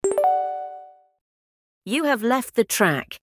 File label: leave track sound en GB